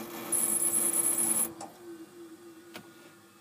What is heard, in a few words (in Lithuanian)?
issijungimo garsas; specams